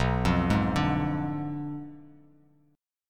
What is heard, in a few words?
B Diminished 7th B down; Bdim7 arpeggiated; Bass Standard